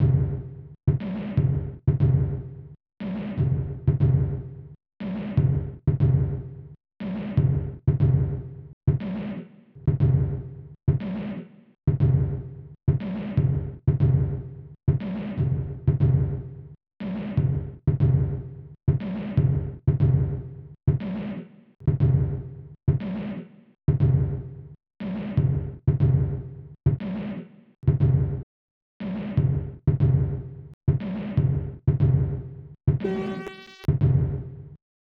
sinewave drums bounce

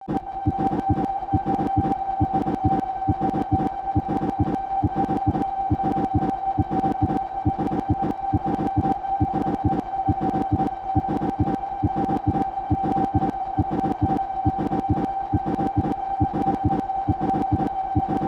Sinewave Project

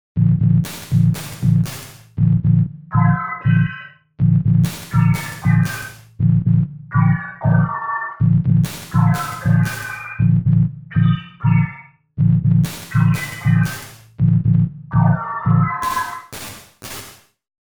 Single Cycle 1K

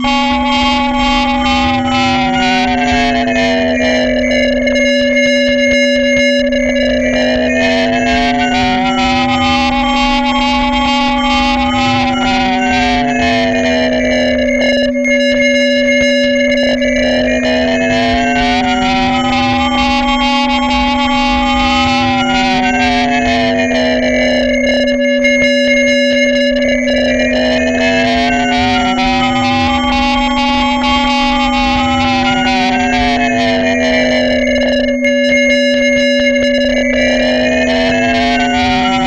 Sine Wave

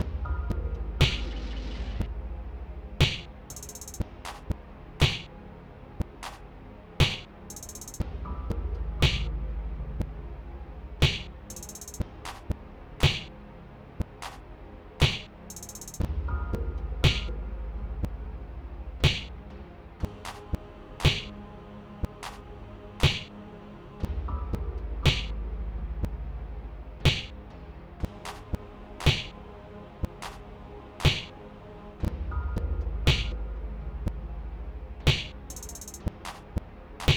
Sinewave

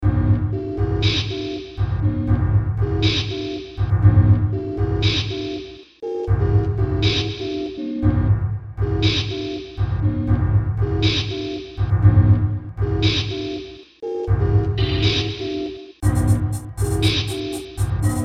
Sinewave

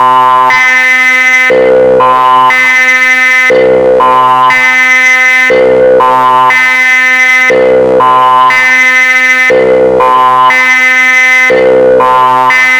Sine Song

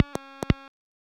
Sinewave Altered Kick

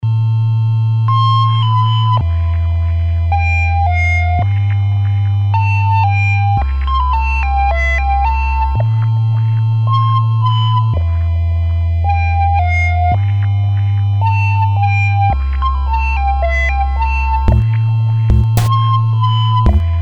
SINEWAVE

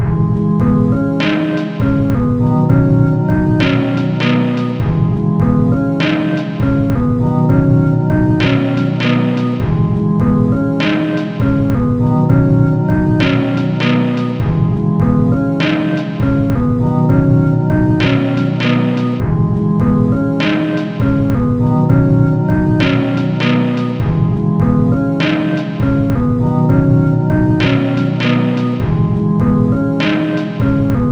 Sine Wave Project

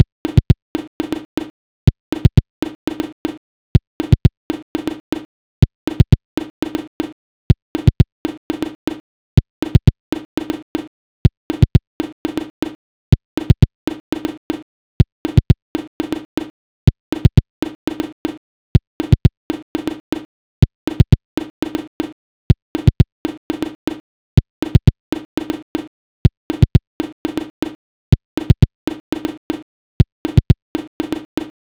Sinewave

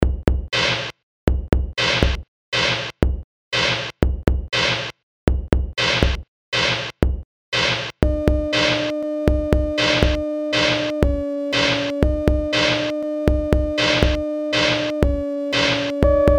Sign Wave

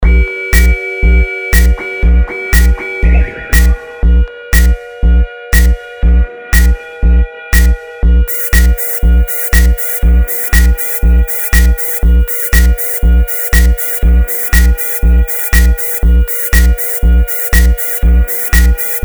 sine wave

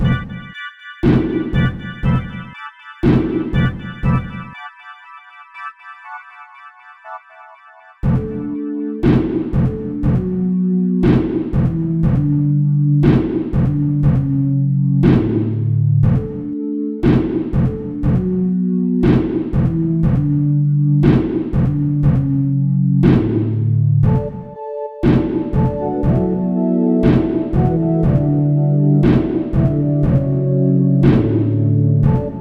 Sine Wave

